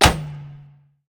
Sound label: auto hit; smite; Apollo